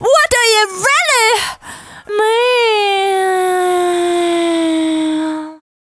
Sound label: Dance Vocals, FEMALE